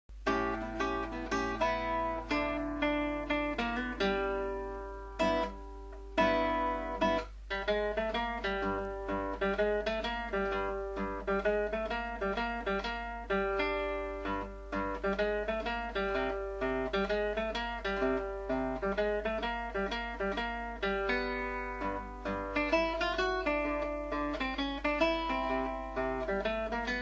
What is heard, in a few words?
Three finger blues, guitar; Jolly Guitar